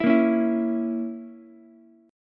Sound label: Bb Diminished Bb down Ukulele; strummed; chord shape